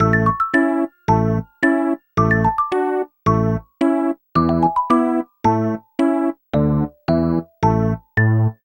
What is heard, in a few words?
Trombone Test; super monkey ball banana blitz